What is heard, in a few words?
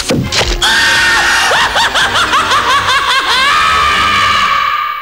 LAUGH